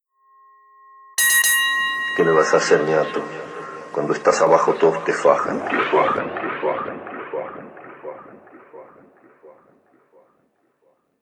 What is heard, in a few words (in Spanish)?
guantes